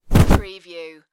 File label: Body Fall Human